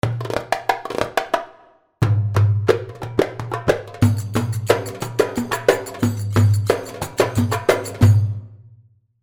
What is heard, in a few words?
Wahrani 090, darbuka